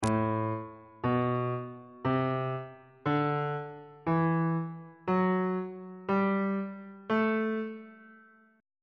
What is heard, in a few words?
a dorian mode note positions on bass clef